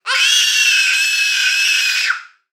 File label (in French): cri long